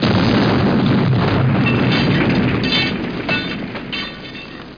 die

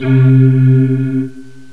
Solo Vox, Synth lead

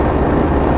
CRUSH HAM; lander

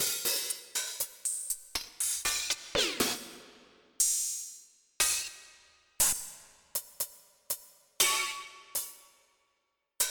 Hats 2; Onj's Soundfont

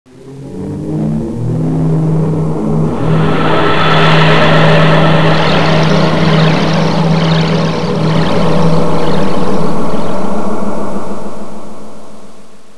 Hall Wash; Total Distortion

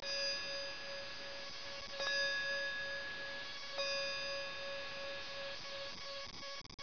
bell; Image Bonanza

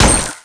ice Cannon Impact; netstorm

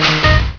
Repair Pickup; Spectre Supreme Demo

